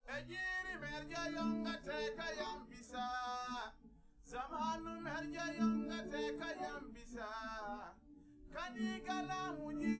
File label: no drums live rec; SiSEC10; underdetermined